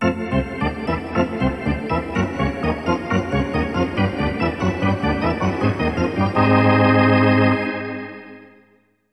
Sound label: Hockey Organ